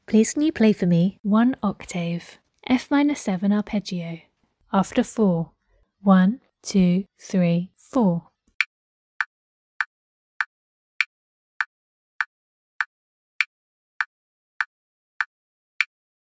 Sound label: f min7 arp